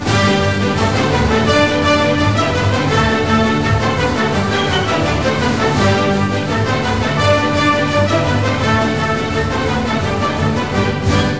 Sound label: cancan